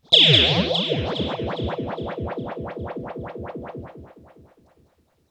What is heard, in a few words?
RIPPLES L, Funk Guitar; 90 s Sample CDs; Zero G